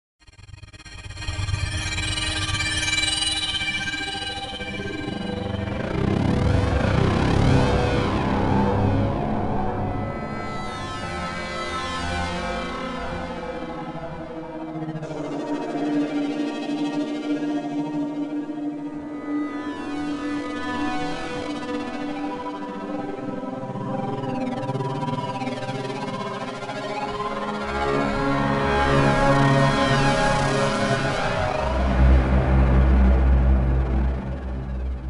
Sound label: Ambience; Red Faction Music